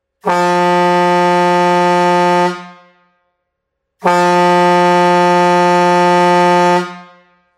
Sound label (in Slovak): klakson 12v 24v chrómová fanfára truck 64cm